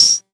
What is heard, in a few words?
Open Hihats, Cymbals; Vengeance Samples